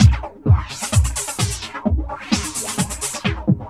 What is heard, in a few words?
WHAWHA; AMG; Global Trance Mission VOL-2; PROCESEDLPS